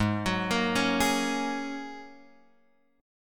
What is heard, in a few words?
G sharp 7th Suspended 2nd Suspended 4th G sharp Guitar Open F; G#7sus2sus4 arpeggiated; x,3,6,5,4,3; JGuitar